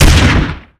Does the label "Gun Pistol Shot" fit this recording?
Yes